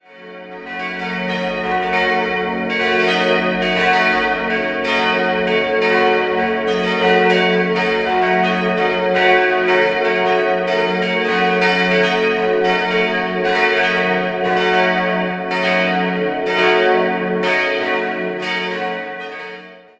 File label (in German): Neuburg Apostelkirche, Glocken